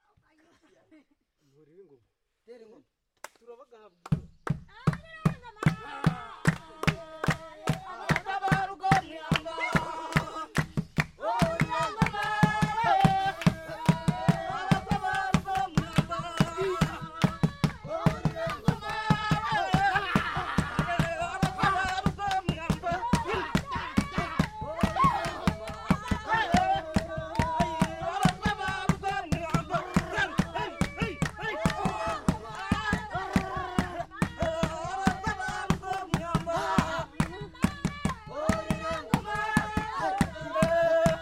batwa tribal song ruhija village uganda

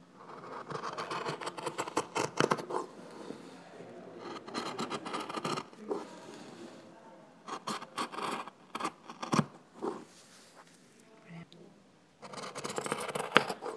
cutting waxed cloth

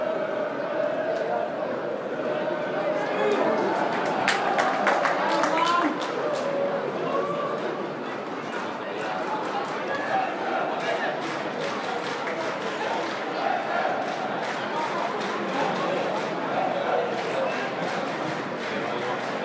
football crowd cheers at Brann stadion; S K Brann v FK Haugesund; Bergen

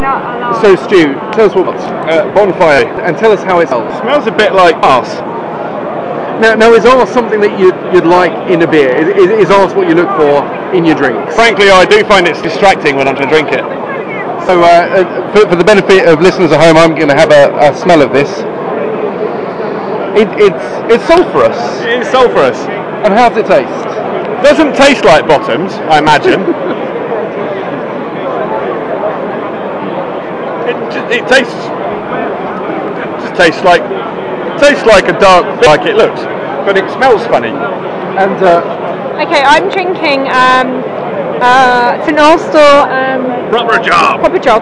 bonfire

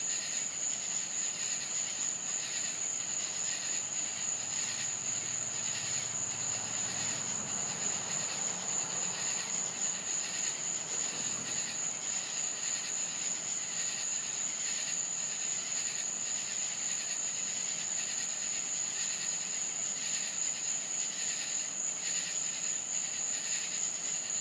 night sounds

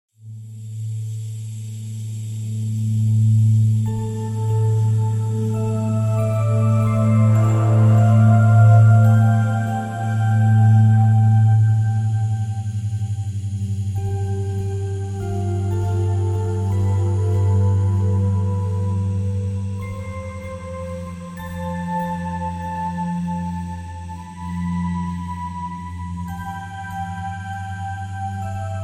work along music; landscape gardening

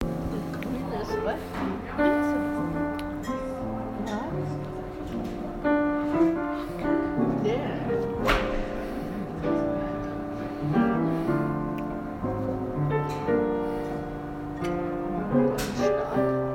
barpiano

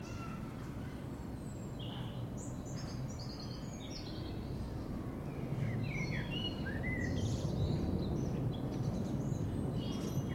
beautiful birdsong from my garden

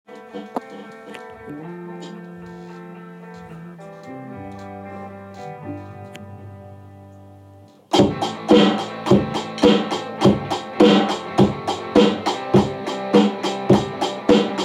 4/4 rock drums

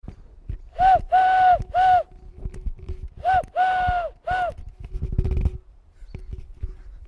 whistling

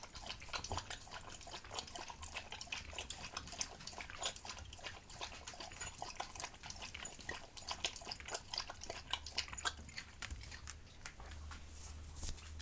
dog lapping up water

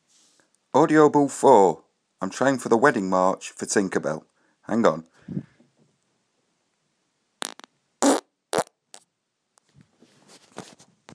fart 4 the wedding march

